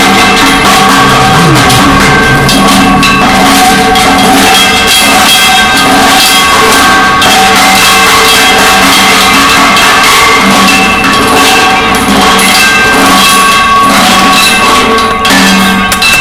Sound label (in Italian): cancello slide; In discesa; Bicicletta